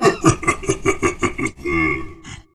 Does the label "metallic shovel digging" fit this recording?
No